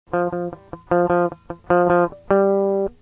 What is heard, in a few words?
ghost note, egitarre